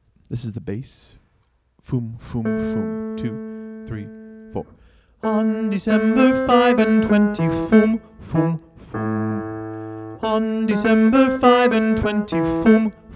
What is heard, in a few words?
bass fum fum